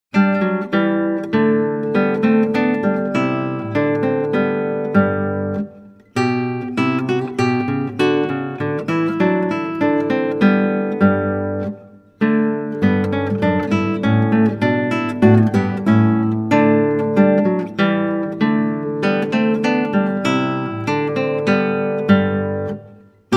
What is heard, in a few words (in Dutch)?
Klassieke Gitaar; Bach 50 Solos